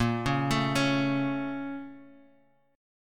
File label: A sharp Minor Major 7th, down, Standard; arpeggiated; JGuitar